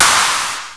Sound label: NOISE HIT